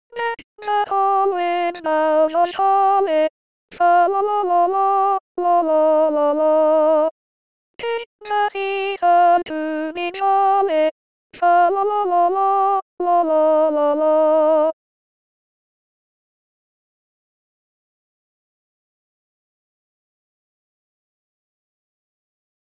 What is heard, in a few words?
Solo; Deck the Hall